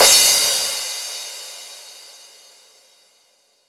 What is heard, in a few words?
Crash, 140 BPM; Vengeance Samples; VTDS2 Song Kits; Female Take Me Away